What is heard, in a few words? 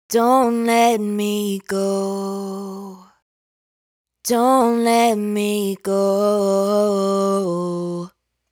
hook, 115, Fm, Vocal, Dry Vocals; Raw Vocals; Dont Let Me Go; Slate Digital Omnivox Vocal Sample Pack